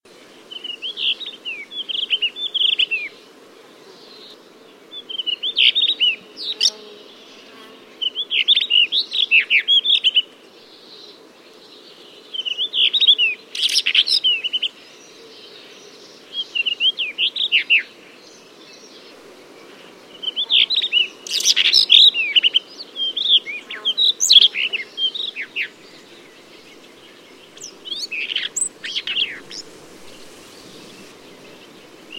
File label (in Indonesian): murai arung ngeriwik